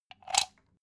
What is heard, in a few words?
socket pull plug hard